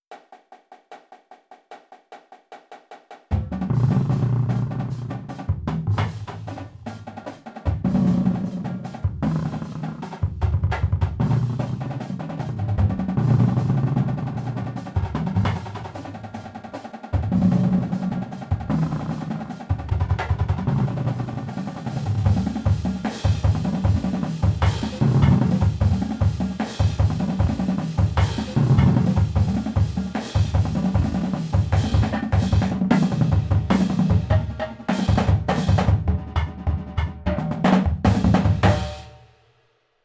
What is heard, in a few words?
paradiddles, percussion